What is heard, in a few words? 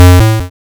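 waveform FM square triangle envelope silence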